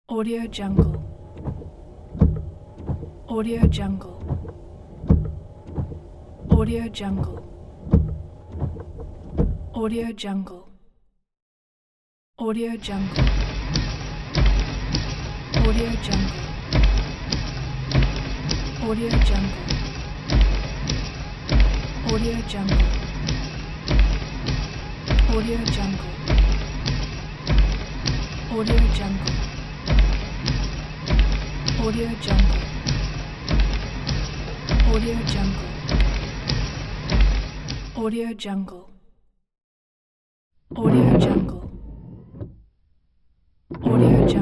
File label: car wipers scraping sounds